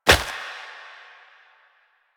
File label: Step Flesh; Warframe; Koumei